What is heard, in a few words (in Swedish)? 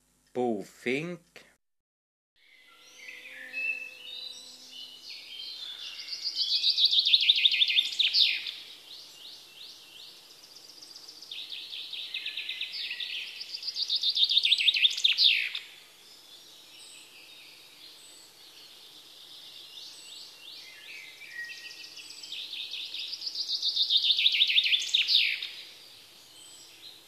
Bofink